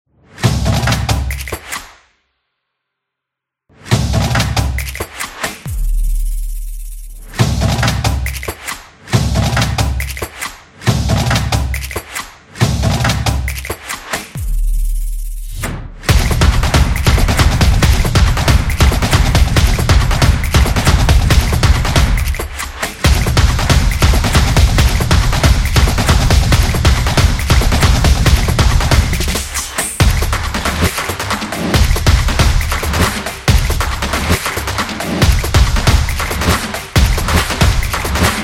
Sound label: The Percussion Action